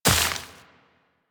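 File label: Step Vegetation, Footsteps, Walk; Warframe; Tenno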